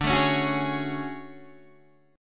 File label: D Major Sharp 11th, Guitar, Open D